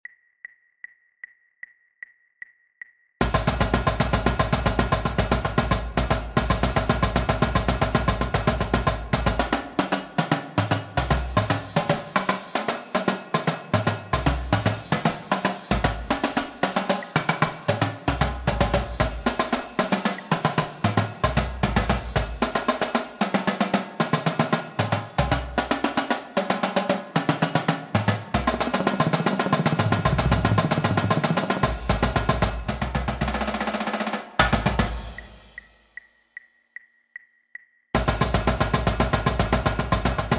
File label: sequence double, Drumline